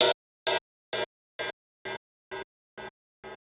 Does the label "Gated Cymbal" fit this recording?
Yes